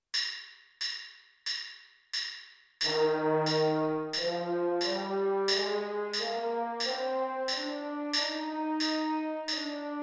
b flat major french horn

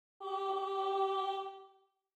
alto

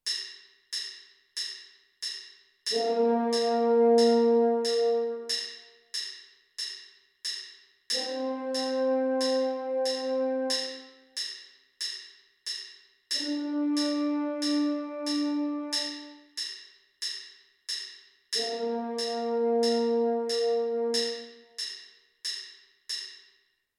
band on parade french horn

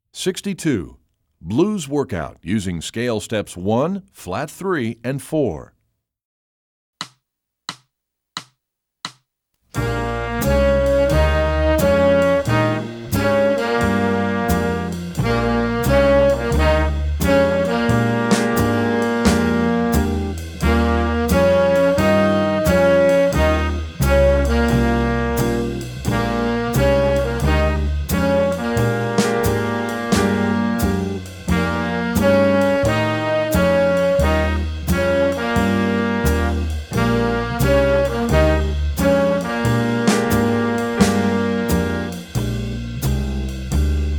blues workout blues scale

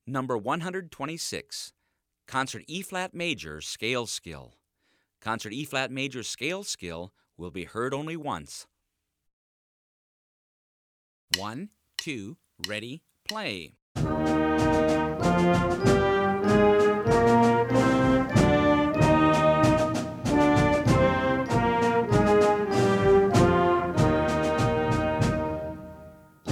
major scale

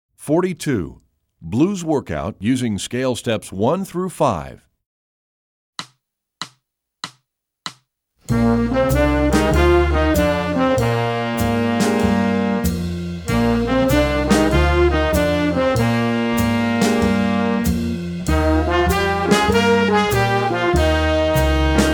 blues workout scale steps

